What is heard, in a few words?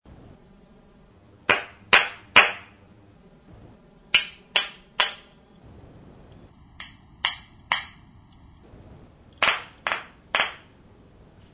claps